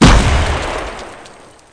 Grenade

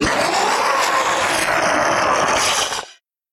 Jump Scare Vocal; The Town

